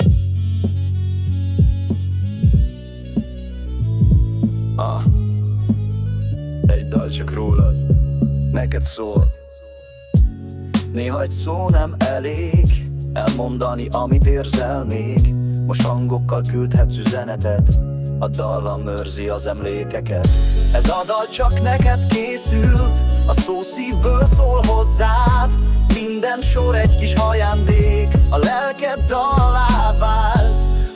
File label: old school hip hop